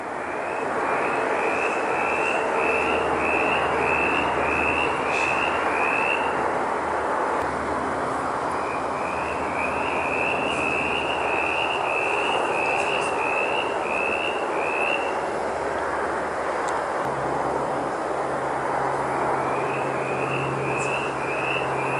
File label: litoria verreauxi; Whistling Treefrog